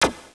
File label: choo